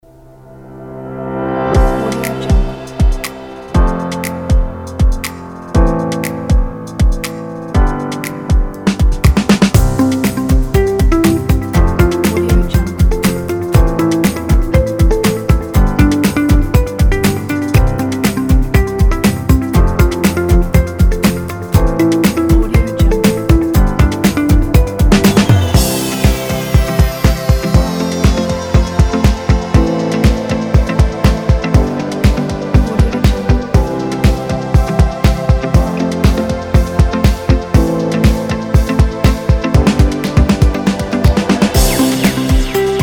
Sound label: friendly corporation